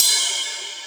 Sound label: Ride, Dubstep One-Shot Drums